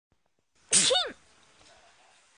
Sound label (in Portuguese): atchim